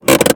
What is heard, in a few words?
transportation car handbrake applied; sound effects one